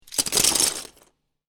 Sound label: Lego Drop Several Pieces Into Lego Box; Miscellaneous Toy Sound Effects